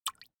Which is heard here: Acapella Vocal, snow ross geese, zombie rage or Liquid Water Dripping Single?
Liquid Water Dripping Single